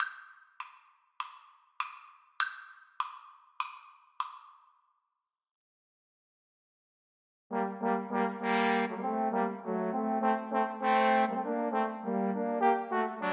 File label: trombone trio